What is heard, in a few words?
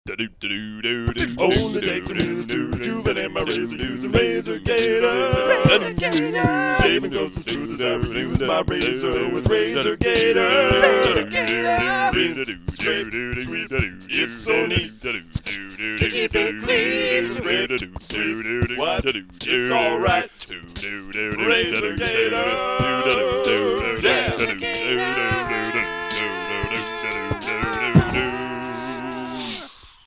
a capella